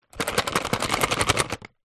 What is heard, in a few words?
crayons in card pack shake; office